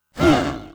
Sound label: Gunner attack